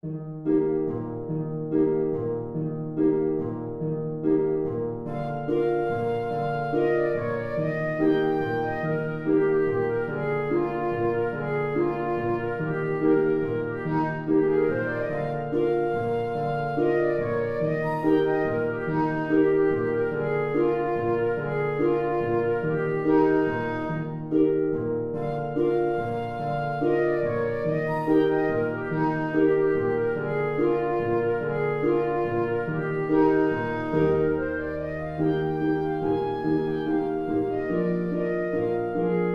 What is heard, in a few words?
spanish; midi-produced sound file Spainsh Dance; Nutcracker ballet